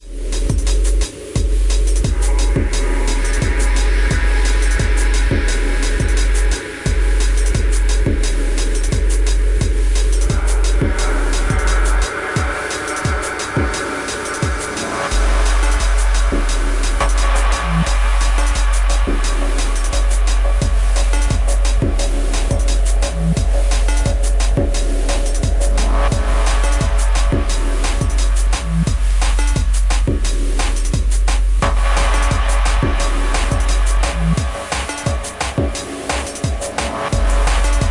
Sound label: Static; Dub technoid Drum & Bass stepper b/w classy, out there Ambient Drum & Bass cut.